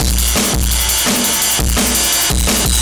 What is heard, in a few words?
Beat Digi 170, distorted drum loops 170bpm; musicradar